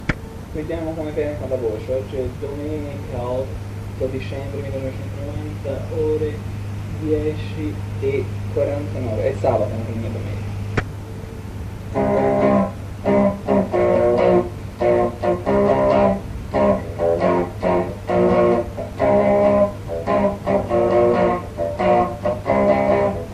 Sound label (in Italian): Night Sad Life - chitarra elettrica